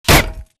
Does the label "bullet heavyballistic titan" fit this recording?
Yes